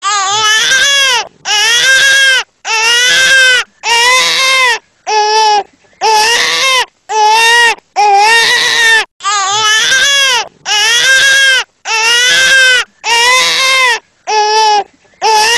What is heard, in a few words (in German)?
Babygeschrei